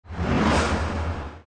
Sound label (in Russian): Zvuk vzhuh dlia montazhnogo perehoda